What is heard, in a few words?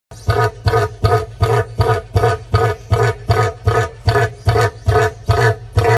southern cassowary